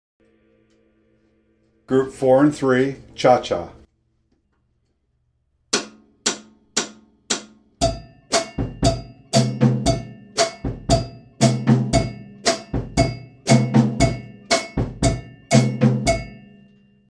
chacha

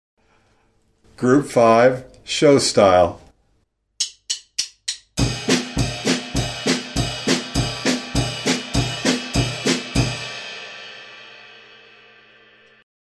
show style

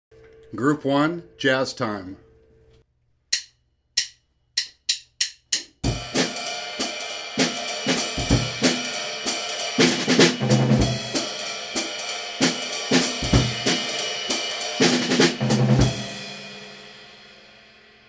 jazz time

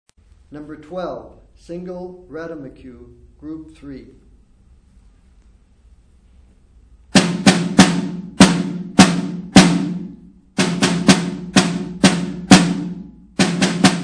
snare rudiment

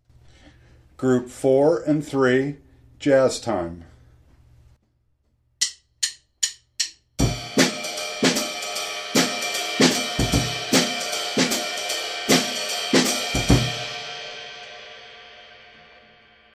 jazz time

